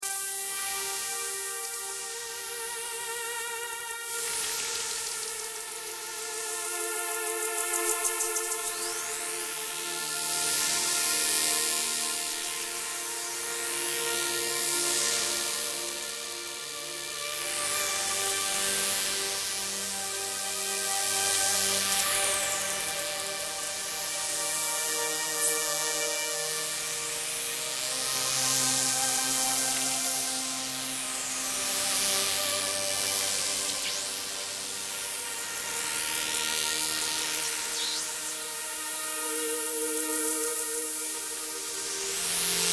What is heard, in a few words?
DSS-2 Filter Mod and Cross Mod; Super Gemini